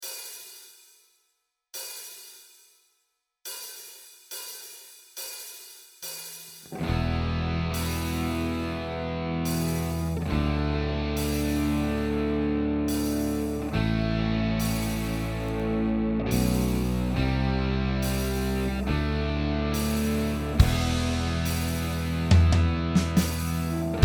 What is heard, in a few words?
Arpeggio Song Backing Track